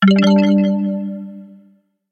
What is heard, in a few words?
mini chime